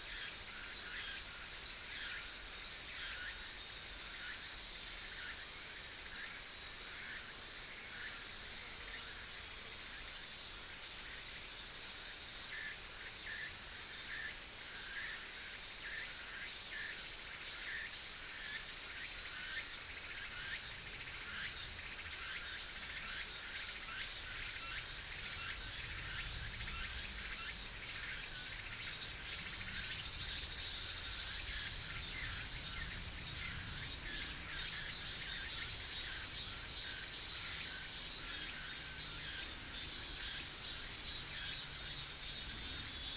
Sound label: mainly insect cluster